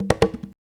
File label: African Perc